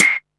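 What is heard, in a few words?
MISC Right, Techno 138 BPM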